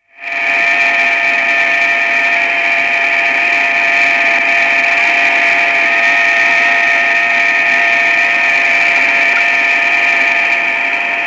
Taurus Satellite, telemetry effects from satellite; Miscellaneous Electronic; BBC